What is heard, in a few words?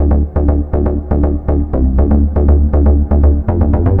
Synth Bass; Basses